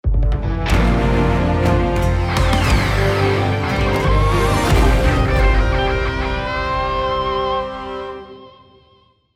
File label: victory fanfare